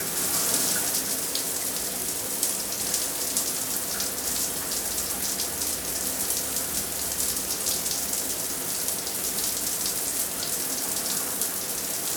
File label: shower